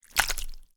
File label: Borax, Impact, Slime, Gore; Borax Experiment; Mattia Cellotto